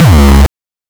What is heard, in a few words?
909 Dist Reso Crushed - C#2, Kicks; Drums; Mbase 11 Kicks